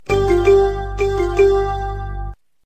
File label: notification